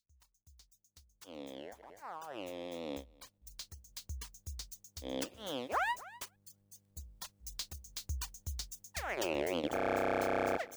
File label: Kaossilator